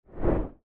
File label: Swoosh Transition; افکت صوتی